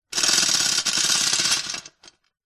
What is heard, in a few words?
industrial chain on metal movement